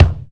mid heavy kickdrum e key, kick sound tuned to the E note. Loudest frequency: 415Hz; kick drum samples